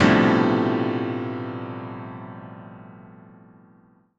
piano

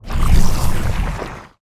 brain nuclei turn

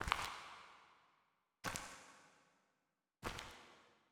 universe fts

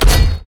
shield

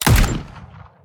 naval gun

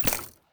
penarrow

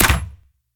bow

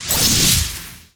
explode

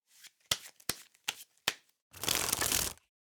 shuffle cards

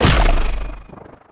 p atk cypunch

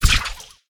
lightsword

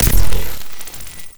thunder

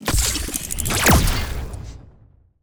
geometry gun

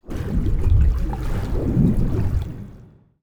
swim deep sea

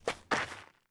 run stop

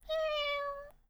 doubt miaow

